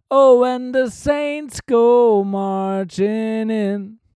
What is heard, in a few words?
Neumann KMS105 1cm Vocals